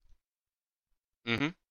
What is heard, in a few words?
mhmm